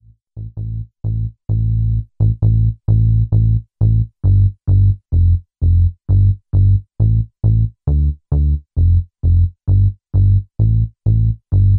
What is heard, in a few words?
bass